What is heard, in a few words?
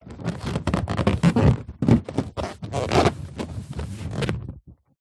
foley balloon large inflated handling squeak